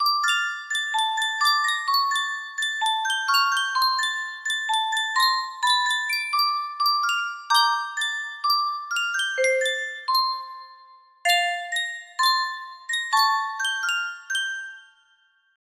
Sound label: Sankyo music box